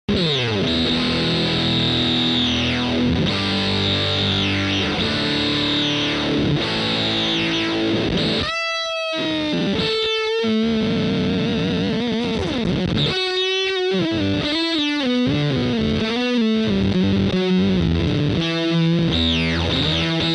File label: DFST-1 Envelope Mode